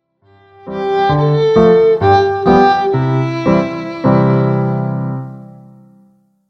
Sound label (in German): boehmische polka